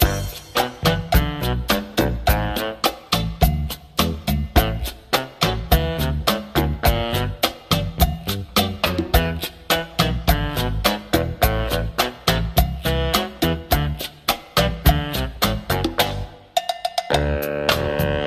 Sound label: access loop; Cave Story Repainted